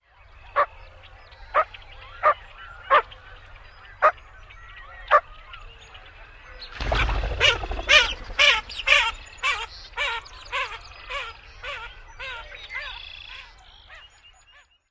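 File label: Blue-winged Teal female quacks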